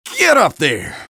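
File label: engineer taunt flip exert; vo; taunts; tf2; engy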